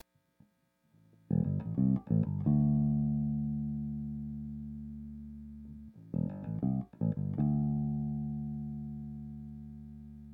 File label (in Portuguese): suspense bass; trilhasparafilmes